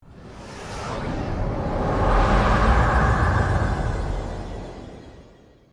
de cloak; Star Trek

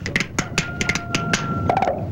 finger snaps; Three Stooges

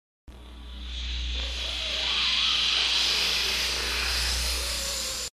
hiss

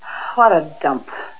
BD dump

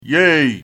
yay

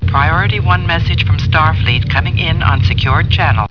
incoming; Star Trek

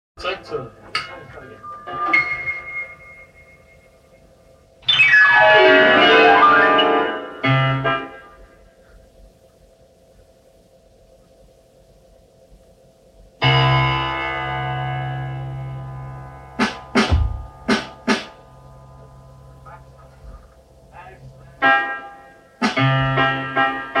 instrumental

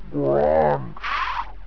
GRRRONTCH; Halloween

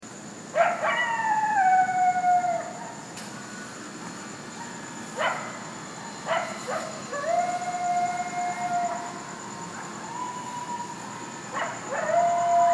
Laurel Canyon Coyotes

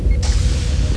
lift open; Star Trek

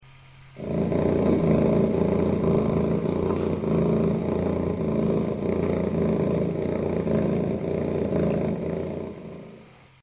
purr

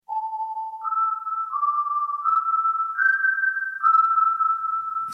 tones

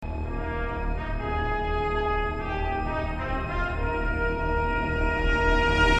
fanfare

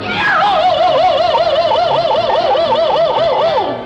Yoooowwwww; Loony Tunes

